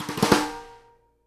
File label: snare GLASS, Live Snares